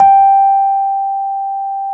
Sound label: E PNO; Synclavier Sampler Library